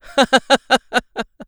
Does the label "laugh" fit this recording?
Yes